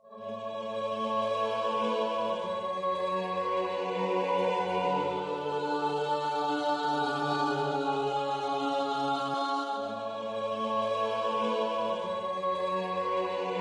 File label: loops choir